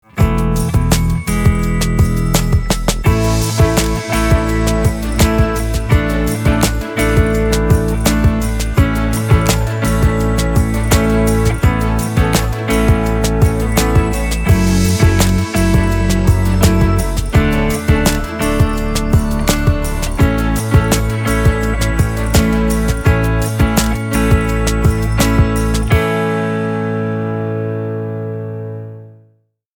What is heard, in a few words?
A HERO BRINGING HOPE INSTRUMENTAL